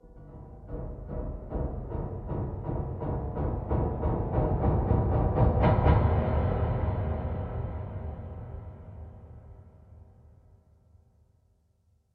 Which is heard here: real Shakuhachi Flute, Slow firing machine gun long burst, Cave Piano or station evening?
Cave Piano